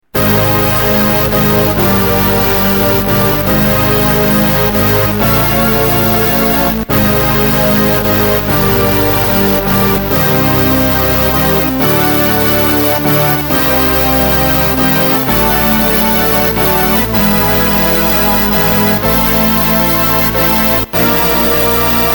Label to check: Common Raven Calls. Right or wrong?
Wrong